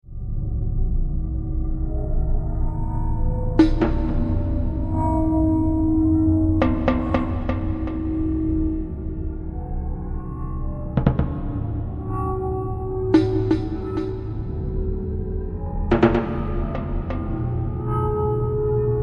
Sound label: waterphone percussion